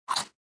Chomp Sound; sushi cat

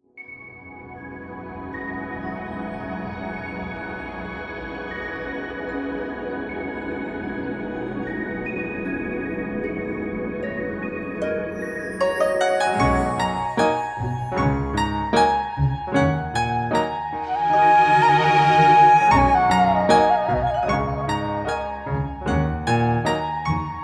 Scene Music; Age of Wushu; Windows

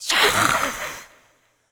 ghost witch voice hiss

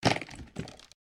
Suitcase Open; don t be afraid the first toy

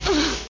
girl hit; super trench attack 2

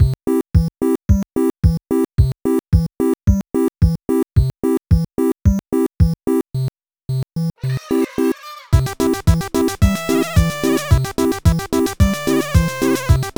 BGM 8bit; korokoro harley switch gamerip 2023

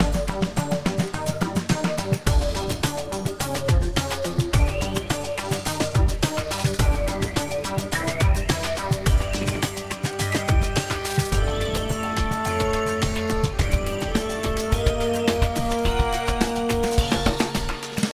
Stealth START

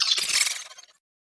Key Unlock Sci Fi; Unending Dusk; Sci Fi Only

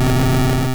Self Destruct; Pokemon; Gen 1; attack moves; RBY

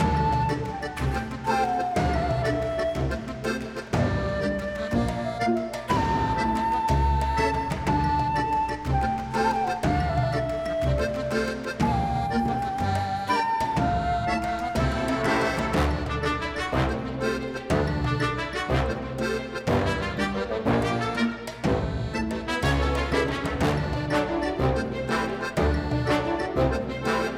Cinematic Music; Jolly Journey

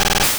Rapid Spin Start

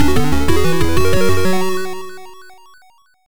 Level Complete; Turrican 2; Atari ST

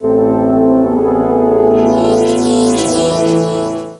Forbode Medieval; sid meier s civilization revolution 2008 nds

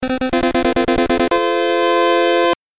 Mission Clear (8-bit); Double Dragon

